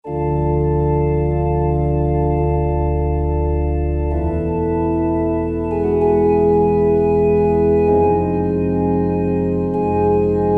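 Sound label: Organ Flutes, Roland SR-JV80-07 Super Sound Set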